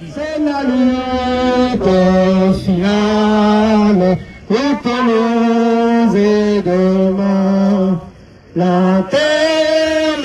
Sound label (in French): Manif ambiance